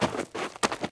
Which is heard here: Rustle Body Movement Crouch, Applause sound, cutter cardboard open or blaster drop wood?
Rustle Body Movement Crouch